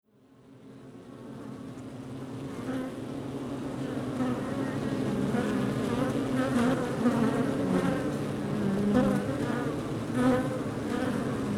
Ruche Exterieur; Nature

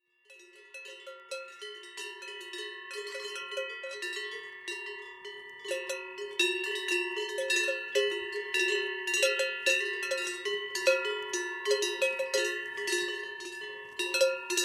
Cloches Vaches

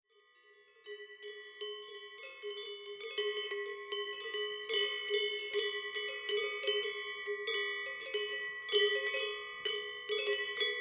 Cloches Vaches

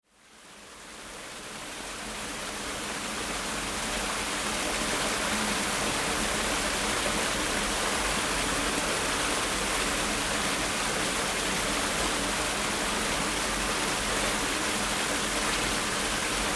Eaux Etangs; Nature

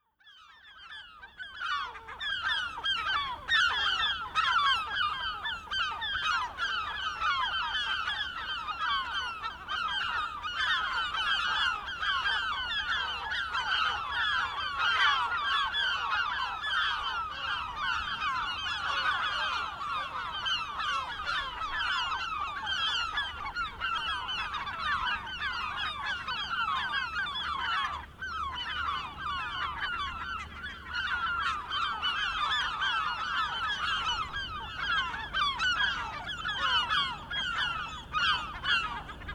Mouettes; Enregistrement improvisé de mouettes à Zeebrugge le 23/05/2004; bruit de fond

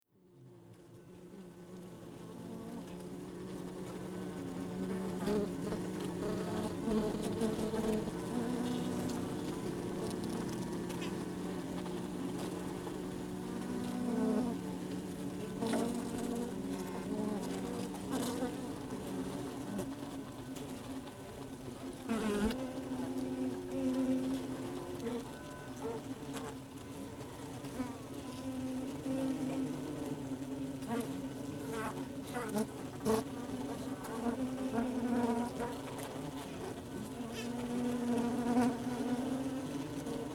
Ruche Interieur; Nature

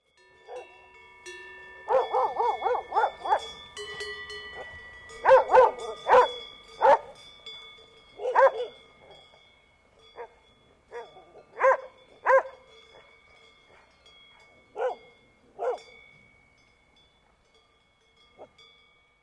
Cloches Chien

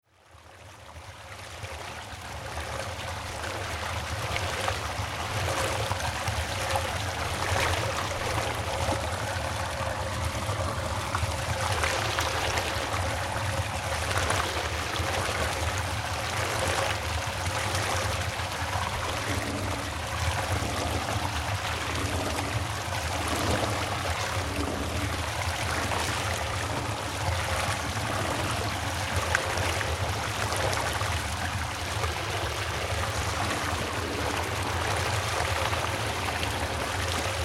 Eaux Etangs; Nature

